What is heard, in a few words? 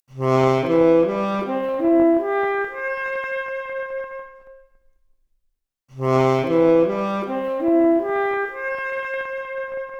Saxophone arp, Stereo output noised; 3bit